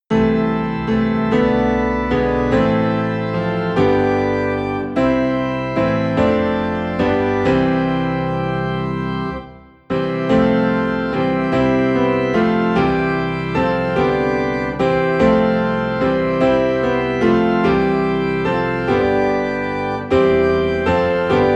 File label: Piano and Organ Low in C; Good Christian Friends Rejoice; christmas